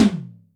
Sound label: Hybrid, Electric Tom, Yamaha DTXpress